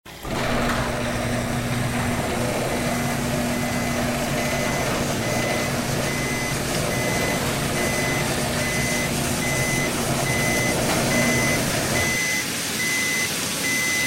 plane full; dassault-aviation

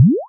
pile on; tenaPuyo

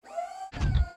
sfx dewbot start jog

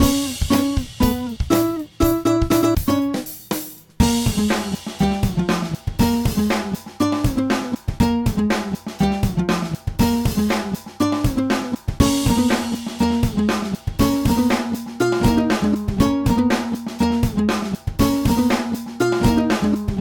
snd Level Part; zj the ball

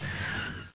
dust skid; madcars2; radicalplay

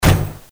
door; patcheconwarsdx

